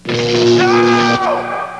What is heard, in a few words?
Zap Oww; simpsons